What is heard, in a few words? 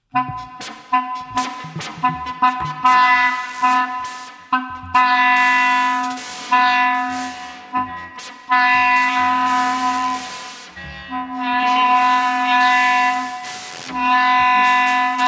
piano forte